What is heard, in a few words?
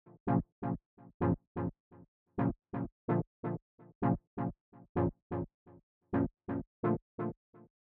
modal shift in parallel motion; 什么是平行和弦？